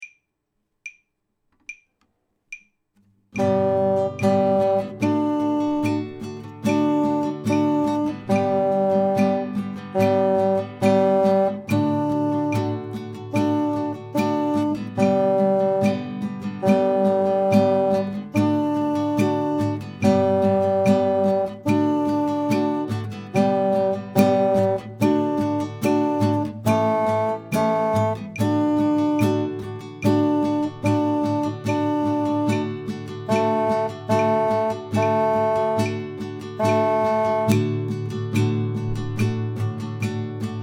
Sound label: duo basson; mozart